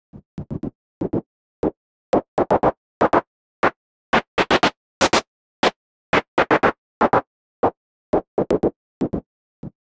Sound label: Gated Noise 120BPM; creative gate; One Shots; musicradar